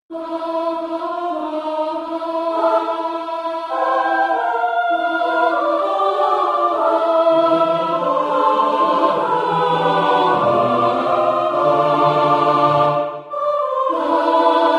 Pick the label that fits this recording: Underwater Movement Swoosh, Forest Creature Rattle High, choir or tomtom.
choir